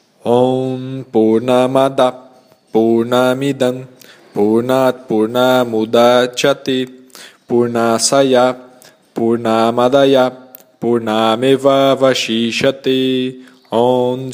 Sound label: Purna Mantra